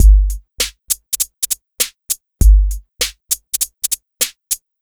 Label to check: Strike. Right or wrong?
Wrong